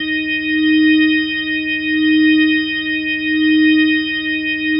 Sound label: Single Note D#3, Organ; Sonic Foundry (Sony Creative Software); Joe Vitale Organ Donor